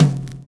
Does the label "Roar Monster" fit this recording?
No